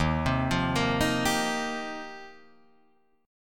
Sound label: D sharp 6th Add 9th, D sharp, down, Guitar, Drop D